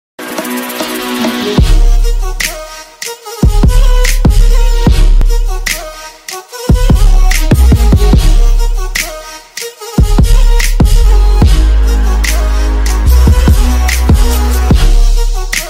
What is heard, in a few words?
ringtone Arabic trap